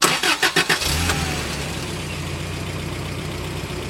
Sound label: i4 engine start